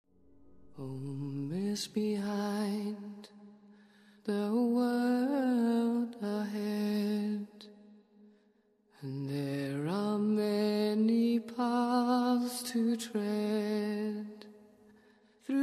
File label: song